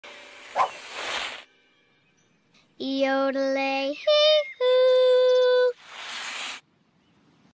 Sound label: yodeling; mittens